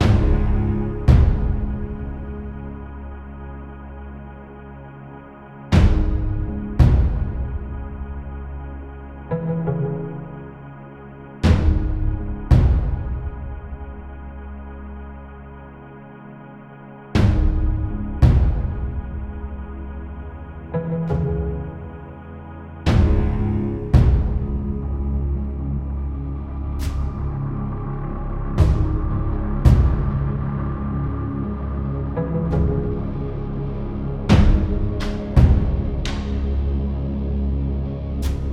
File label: Caves Loop; Super Dungeon Tactics